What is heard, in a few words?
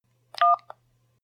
landline dial single beep